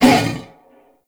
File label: Wolf Attack Short; Animals; Duviri